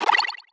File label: retro